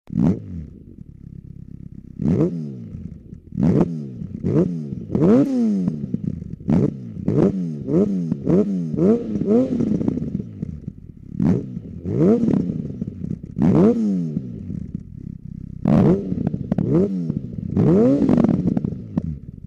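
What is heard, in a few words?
Son Ligne AKRA XJR, exhaust; AKRAPOVIC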